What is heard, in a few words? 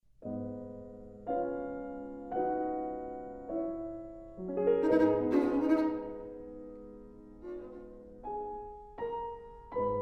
Violin Sonata; Janacek